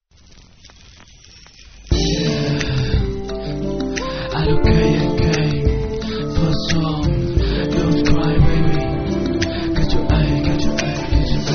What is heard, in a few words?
rap; viet rap